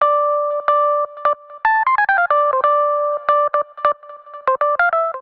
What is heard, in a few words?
Electric Piano D Minor 92 bpm; MIDI Clips; Tonal; Melodic; Little Amp; Ableton; Factory Packs; Chop and Swing